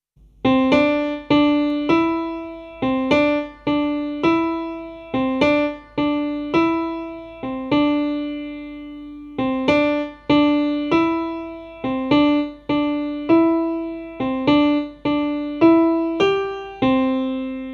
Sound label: music